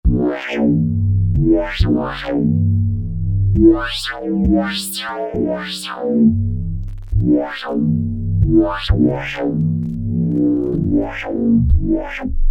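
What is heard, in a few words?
DnB Bandpass Bass, Novation XioSynth; Novation XioSynth 25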